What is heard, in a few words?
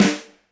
Flam